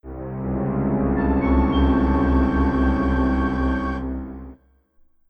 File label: Unknown Item Acquired (Mono); Metroid Zero Mission Remastered